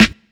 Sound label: original steel snare drum A key